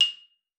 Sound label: XYP F6, XYLOPHONE; Xsample VOL-4